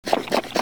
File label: water pump, weapons